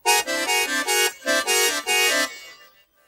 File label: Noisy Accordian; pingu the original soundtrack